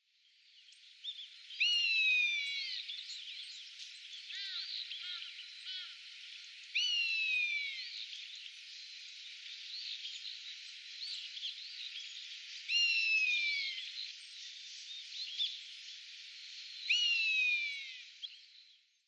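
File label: Eastern Buzzard, sano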